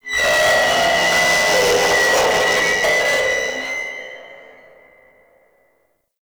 Speaker Stack Screech; Ambience; Props; Infested; Warframe; NineteenNinetyNine

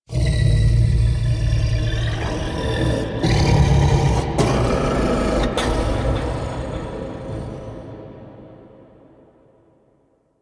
Misc Whispers Large; Warframe; Ambience; Entrati

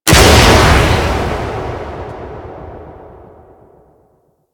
Death Explosion; Attacks; Warframe; Hyena

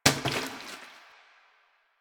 Plastic Bottle Water Drop; Janitor

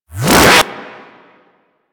Charge Gun Shot Retract A; Crp Charge Gun

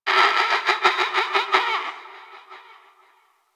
Forest Distant Medium Creature Small Call; Ambience; Warframe; Grineer Forest

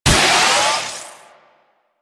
Damage Amp Power Up; Warframe; Trapper

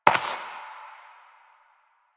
Step Dirt; Warframe; Koumei

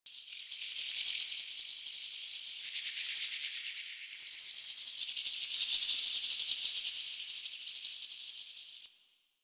Landscape Insect Loop; Warframe; Ambience; Venus Open World; Positional Stereo Loops

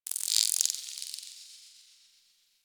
Cephalon Tech Very Small, Ambience

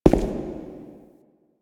Shadow Figure Steps; Warframe; The New War; Zariman Shadow

